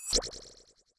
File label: appear; battle